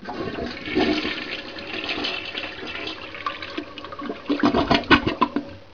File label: toilet